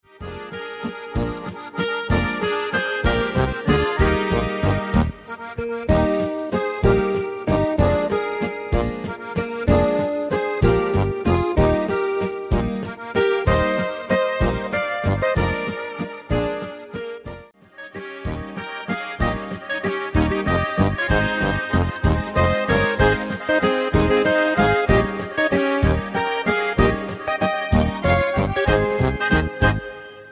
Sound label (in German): Oberkrainer Walzer